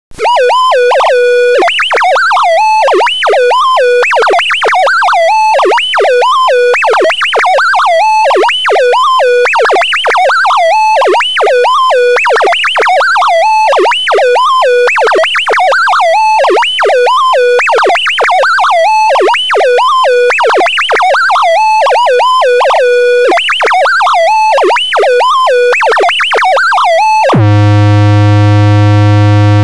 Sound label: rhythm